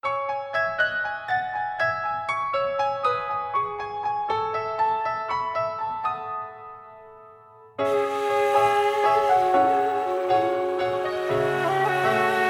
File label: SINGBACK, BULGARIAN